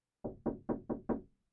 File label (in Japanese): ノック; 動作系